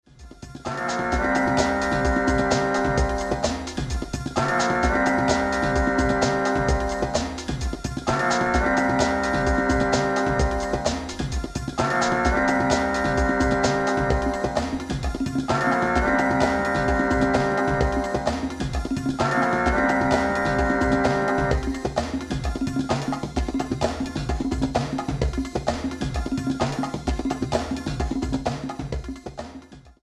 Chant Dub

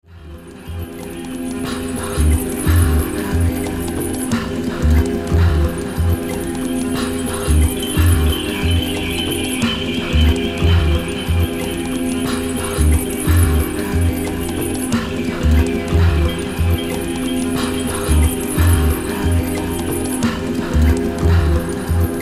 Singing Sand

